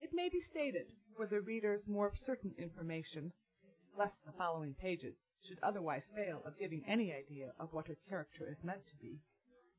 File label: female inst sim; SASSEC07